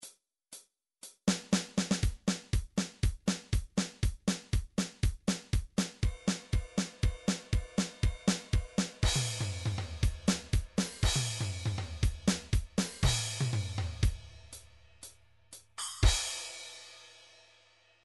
midi drum patterns drums country

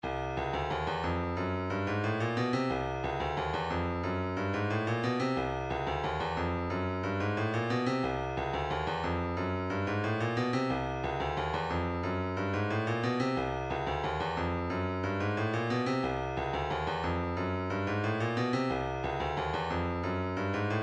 room, midi

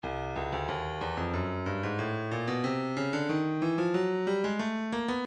breakbeat 092 bpm; progresso c; Stylus Breakbeat Midi Files

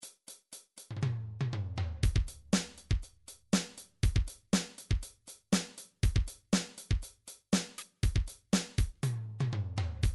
drums slow rock, midi drum patterns

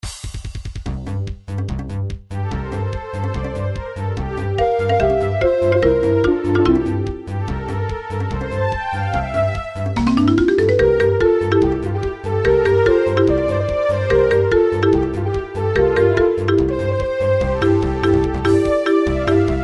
mini boss battle; nintendo 64 paper mario

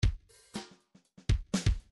bdf midi file grooves funk a funk a

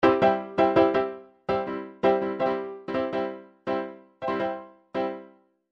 chord lines boogie, midi; Midi Files 1000

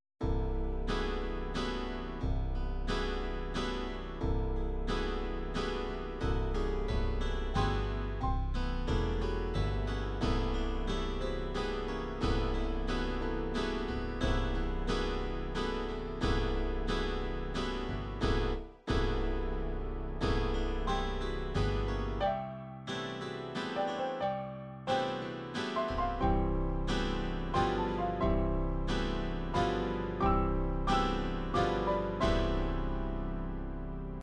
psr9000 waltz, midi

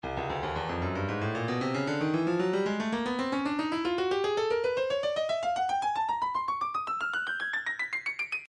stylus breakbeat midi files 114 bpm; Turnpike

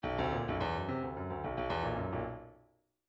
bdf midi file grooves funk k funk k

